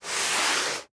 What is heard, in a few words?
Book Hardcover; HauntedBloodlines; SFX Producer